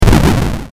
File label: explosion, sfxr